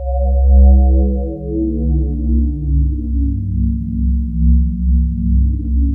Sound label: BELL STEE, ATMOSPHERES; Sound Gallery - Charged